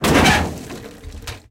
bollard crash, tanks

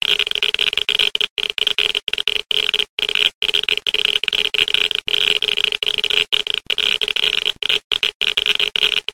geiger high; Rust

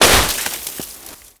snowmobile suspension splash; veh snowmobile suspension splash

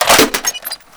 m60 reload clip out, foley

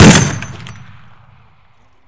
Vehicle Weapons; Red Orchestra Ostfront 41-45

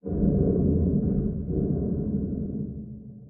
damage vehicle; World Of Warships

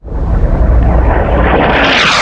Artillery; Red Orchestra Ostfront 41-45

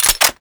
pickup ammo

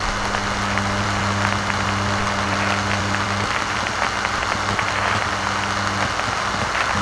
tower; ambient; World of Tanks Blitz; ordeal

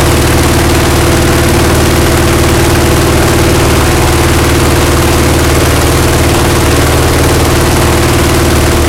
hellcat side, engines; War Thunder

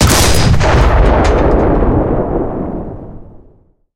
cannon 75mm kwk40 shot, tanks; cannons; War Thunder

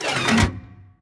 gun reload; World of Tanks

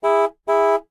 ambient port; World Of Warships; port3d

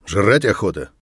tired, voice; Escape from Tarkov

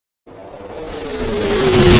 incoming; Explosions

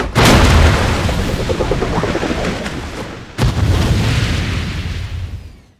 damage vehicle; World Of Warships

